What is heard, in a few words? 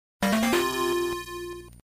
alert follow